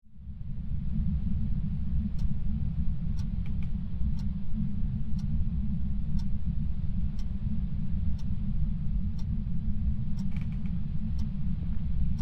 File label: kspace ambience